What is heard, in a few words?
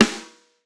Snare Acoustic; Drums; One Shots; Abolished; Ghosthack - Drum Hero 5